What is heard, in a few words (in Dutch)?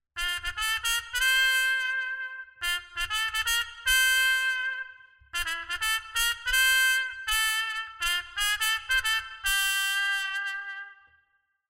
Tin mute trompet